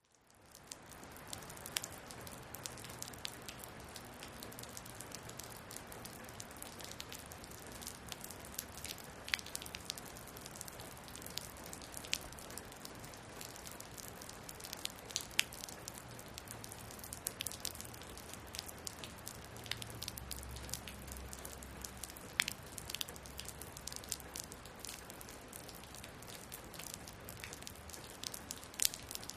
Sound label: Rain On Porch Heavy